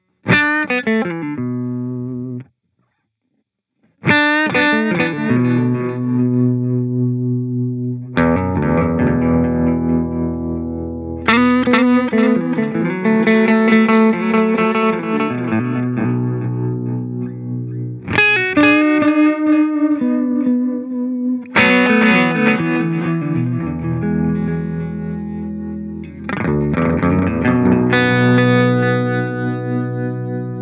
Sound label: Trem Delay